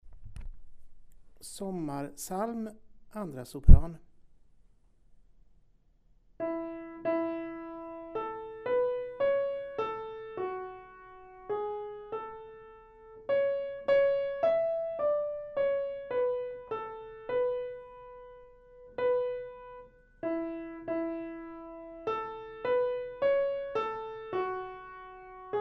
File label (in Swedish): Sommarpsalm SOPRAN